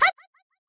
jump; Mystical Ninja Starring Goemon

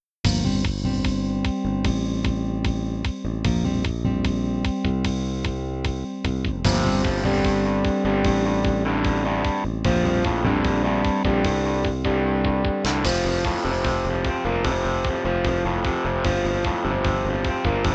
Guitar Challenge; the sims bustin out; gba

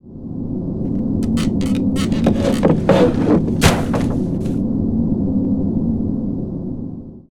TreehouseLadders; Lucius3; Item

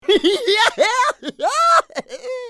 Pig laugh; bad piggies; android; mobile; windows

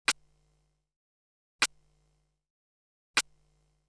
Card, string quartet

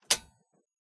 Switch Circuit Breaker On; hello neighbor pre-alpha alpha 1

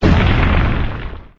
Crash; Learn to Fly 2; Title Crash

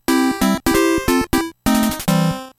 Fail; Ice Climber; arcade

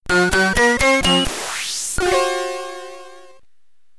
Jingle, SID Stereo; Nighthunter; Commodore 64

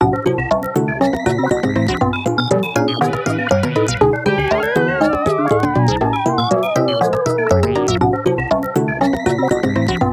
Prebattle - Imminent Clash; Miitopia

